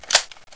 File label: clip insert, weapons